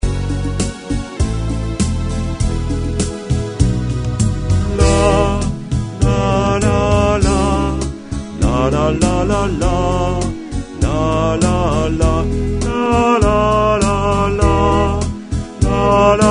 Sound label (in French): tenor